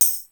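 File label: tambourine; sr16; perc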